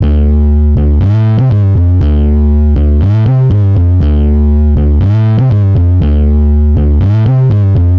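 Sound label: Bass Retro D major 120 bpm; Guitar and Bass; Ableton; Factory Packs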